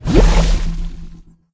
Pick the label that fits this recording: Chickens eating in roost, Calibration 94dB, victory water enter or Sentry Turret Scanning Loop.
victory water enter